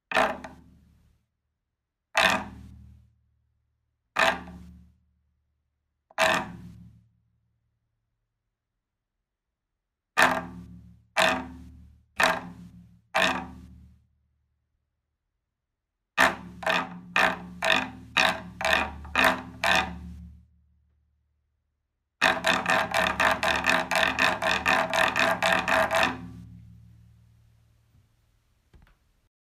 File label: taiko